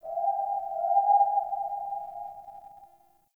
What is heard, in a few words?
Day Distant Creature; Ambience; One Offs; Glades Of Andurin